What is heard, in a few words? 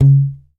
YAMAHA5 MUTE C#3, Bass